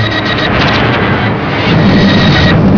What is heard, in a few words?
power machine, ambient objects; hl2